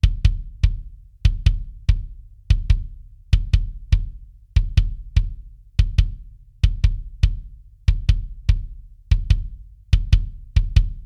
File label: kick replacement